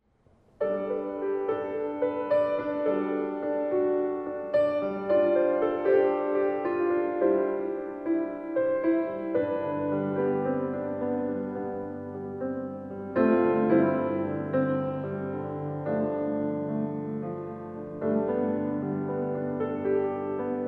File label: Piano Improvisation